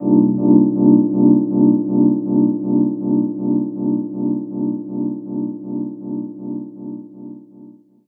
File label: Chords Tones; Equipped Music; Loungin House